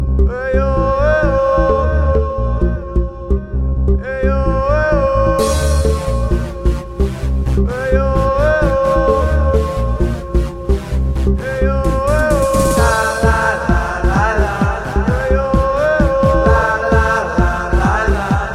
lala lalala e oo eoo

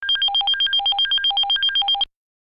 Motorola iDEN

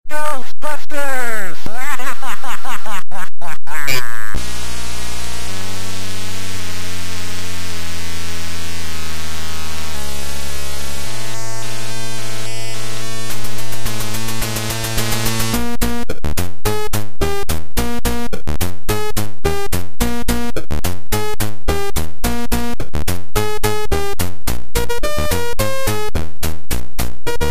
c64; ghostbusters

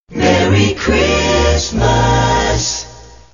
merry xmas sms

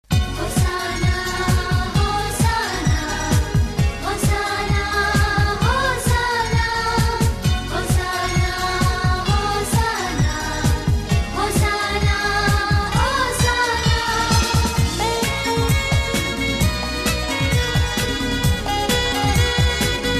hosanna tune

